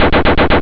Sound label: laser